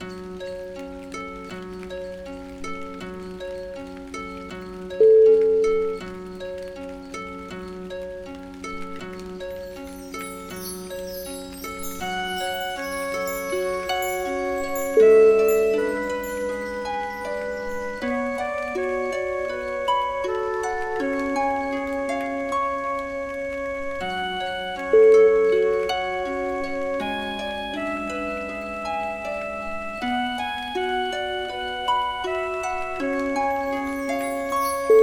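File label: celtic dawn serenity, music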